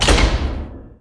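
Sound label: door close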